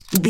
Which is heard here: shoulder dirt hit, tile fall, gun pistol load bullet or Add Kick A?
gun pistol load bullet